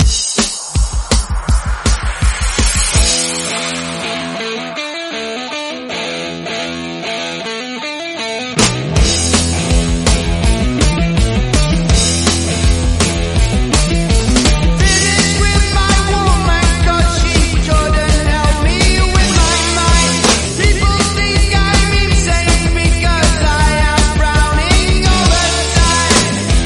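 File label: ReDrum, 163bpm, E minor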